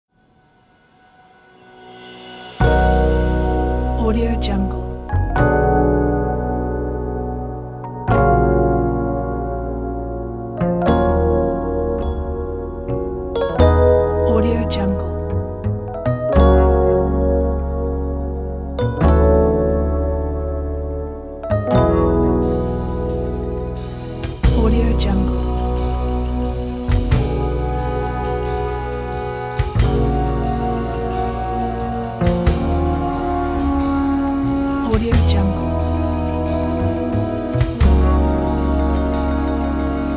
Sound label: smooth lounge jazz